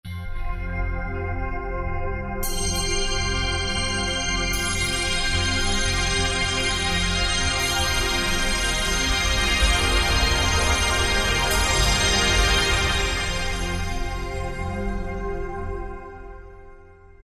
Alien, Yamaha TG500

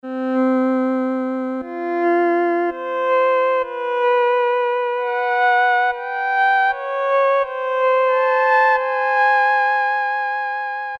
elec. piano + envelopes, Casio MT-68; tweaked preset sounds

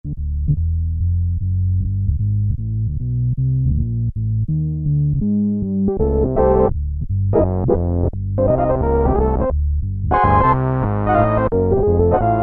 Korg Poly-800, Organ Lower Manual

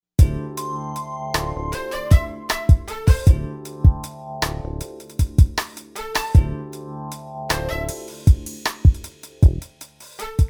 SLOWJAM, AKAI XR20 Factory patterns

